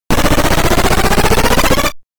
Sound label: Battle Mode Transition BGM; konami famicom chronicle vol.3 rom cassette edition